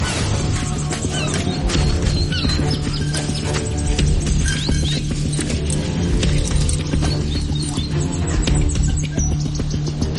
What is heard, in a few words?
Tense Action